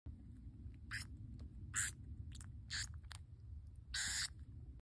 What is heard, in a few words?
iberian shrew; حشره خوار ایبریایی